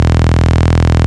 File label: Synth Bass; Vengeance Samples; VEE Synth Shots